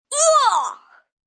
Pain Oowah, Grunt, Impact, 1 boy, forceful, human voice, kid, child, groan, moan; Sound Effects:Voice; VoiceBox